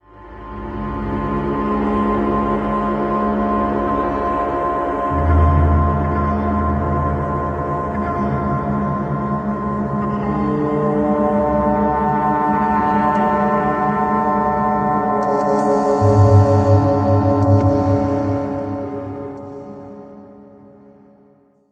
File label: hallway